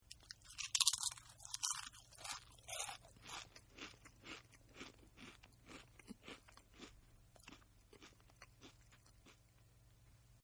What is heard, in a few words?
Chomp Chips n Chew; Eating; Sound Effects:Human; Partners In Rhyme